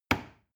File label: plastic button small push heavy